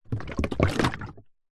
industrial power steering fluid in plastic bottle short shake movement